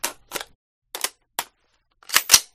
scout reload, guns

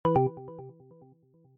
loose points

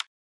roulette small buttons

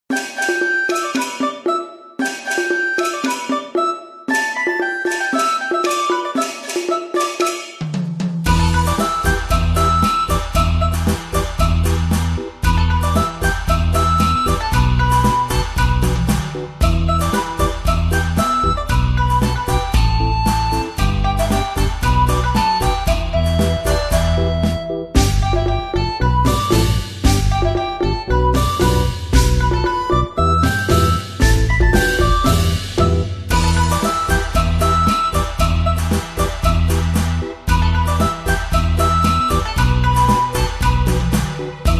music

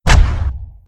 impact

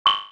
new msg

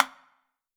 hit, electronic

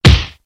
Stunned; basketball-stars

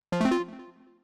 roulette

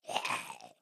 zombie idle

